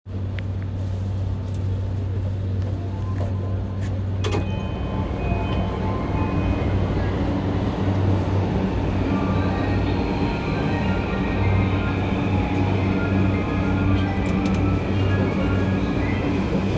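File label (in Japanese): 山手線 目黒 clockwise, train sounds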